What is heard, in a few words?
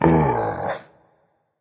seriously berserker; cuchulainn